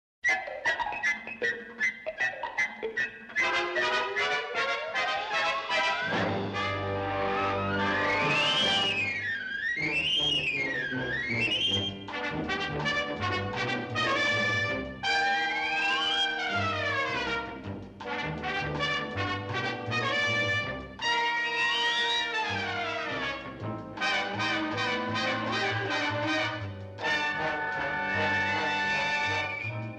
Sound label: Ringtone